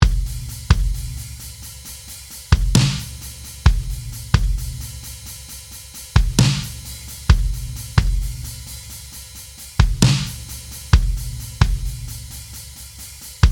Low Tuned Drums

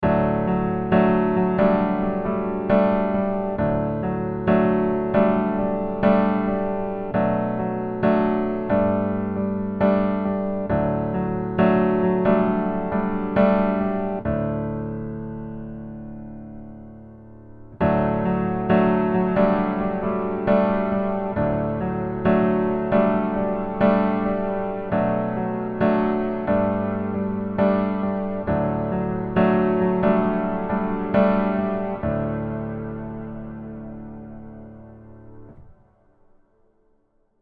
Smooth Piano Plate

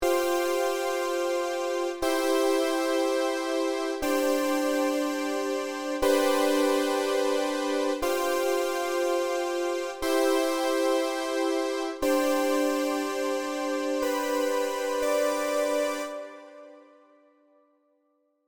Bright Edgy Pad

